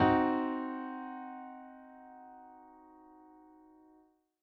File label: triad Cmb5, chordtype triad